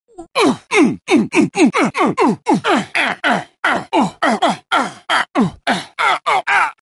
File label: hitting sound